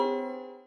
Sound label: chord